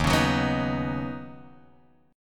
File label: Eb Minor 7th Sharp 5th, down, Guitar, Drop D